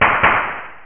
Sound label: Claps; Realmz